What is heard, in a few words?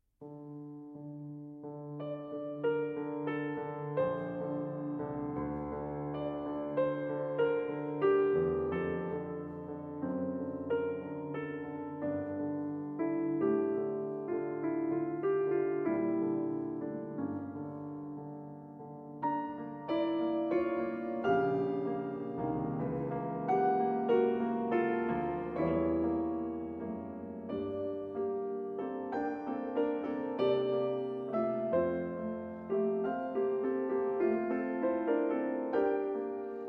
Romance in G Minor For Piano